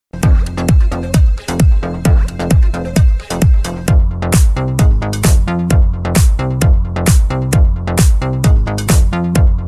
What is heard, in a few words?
disco android new